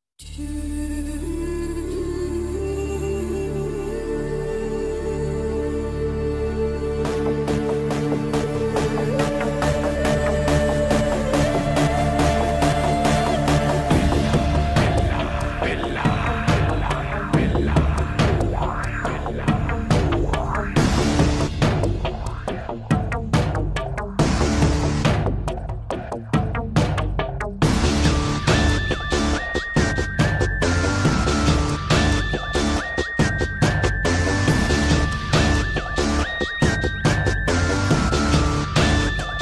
mass bgm ringtone; thala ajith; billa

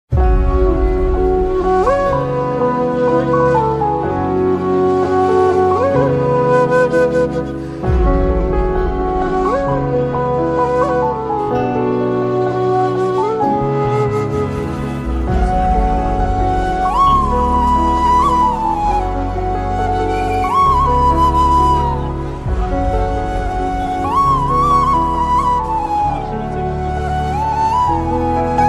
sad bgm ringtone, telugu bgm ringtones; paagal

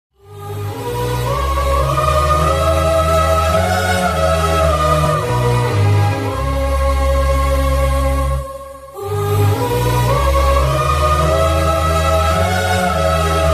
sad bgm; ghajini; kalpana dead

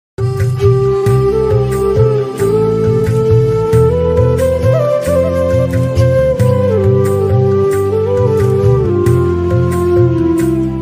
tamil love ringtone

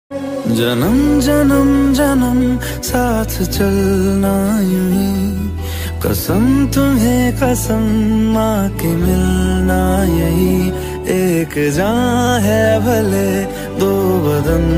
romantic ringtone

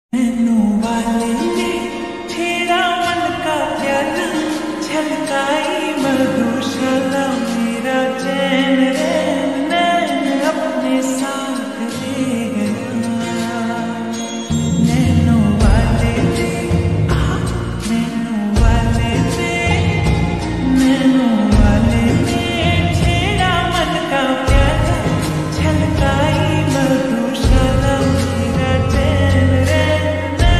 slowed reverb ringtone

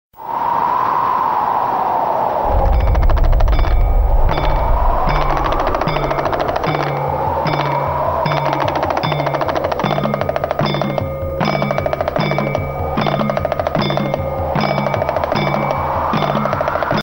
damru style ringtone; shiv damru; mahakal; mahadev

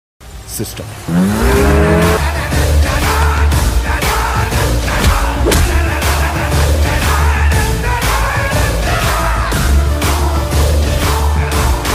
trailer bgm; mosagallu; vishnu manchu; kajal agarwal

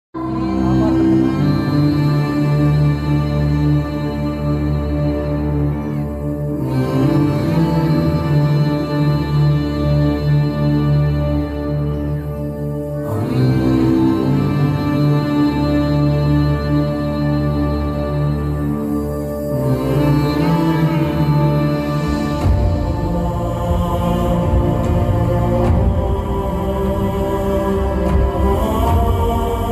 death emotional bgm ringtone; acharya

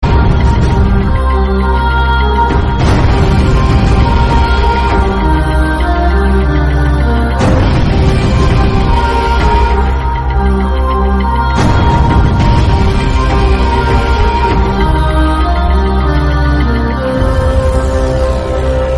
Theme Instrumental; Varisu

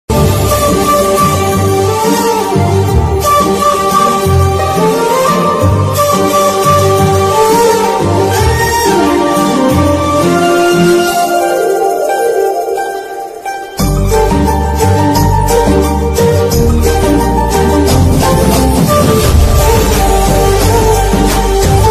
movie background music ringtone bgm; shashi movie

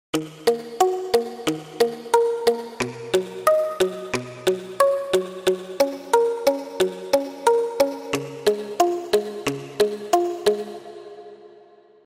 ringtone

